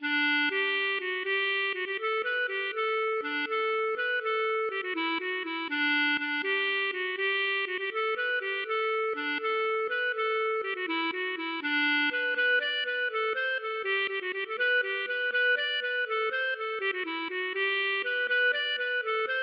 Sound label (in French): bourree; Issoudun